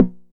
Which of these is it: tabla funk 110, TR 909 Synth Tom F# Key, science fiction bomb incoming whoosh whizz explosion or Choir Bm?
TR 909 Synth Tom F# Key